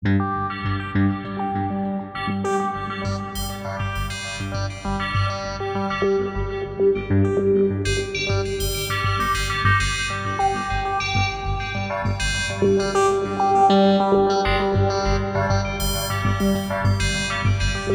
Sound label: Yamaha Motif XF7 ARP Filtered Arp; Alexy.Nov; Too Far Away